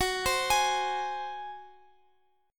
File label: Gb Suspended 2nd Flat 5th Guitar 4A,4E,5A,5D,5G sharp,6C sharp; Gbsus2b5 arpeggiated; x,x,x,16,16,19